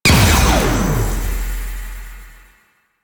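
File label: Ability Interrupt Explosion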